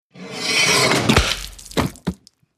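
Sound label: Guillotine Medium Blood, gore; gore stabs & eat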